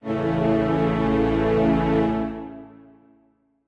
Strings start

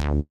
D3 bass

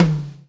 Tom

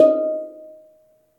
Steel Drum Eb5

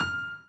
Piano Chord

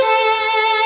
violin; osu

